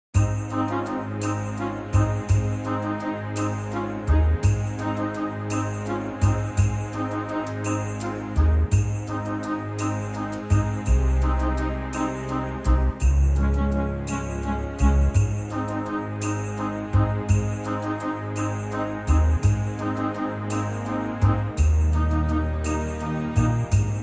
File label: Ab orchestra; key - Ab - vocal range - Ab to Eb (huge range); Gorgeous orchestral arrangement (different arrangement from the female versions)